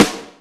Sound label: Room Rim, E-MU Proteus-2000